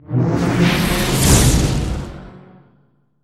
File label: manticore; monster